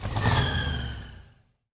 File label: Goblin Uber Charge